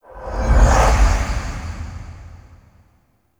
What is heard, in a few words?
Memory Room Vision Zoom In; Warframe; Sacrifice